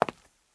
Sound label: Back Blade